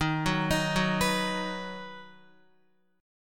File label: Eb Minor Sharp 5th Guitar; Ebm#5 arpeggiated; JGuitar